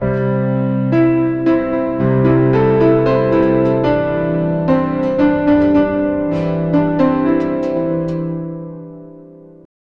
soft piano with gentle, instrumental

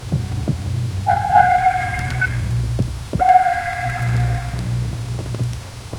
early morning fog golden, surreal

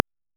ultra short 8 bit click

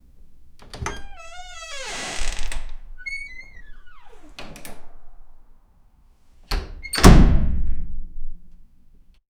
door

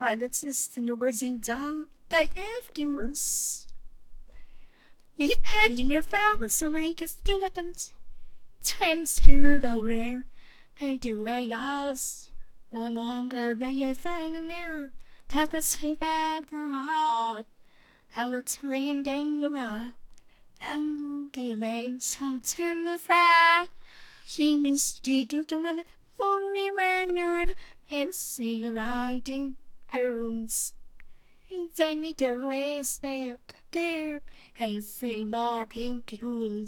instrumental, circus

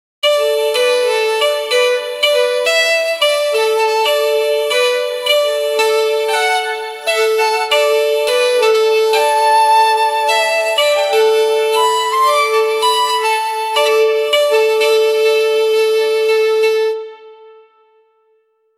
sacred instrumen, ritual

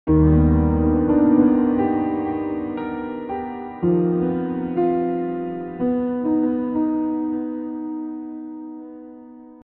soft emotional, ambient music

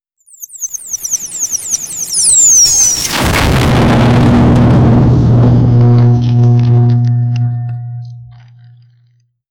epic sound of legendary c, event

